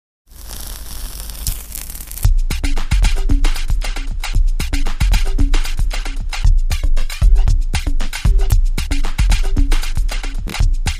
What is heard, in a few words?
Snare Attack